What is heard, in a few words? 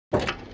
target turn back; Rapid Gun; ipsc